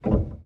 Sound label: piano release